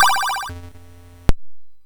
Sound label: Turn, SoundEffects, MOS6581R2